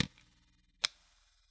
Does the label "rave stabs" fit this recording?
No